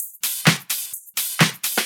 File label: House loops 128BPM; Vengeance Samples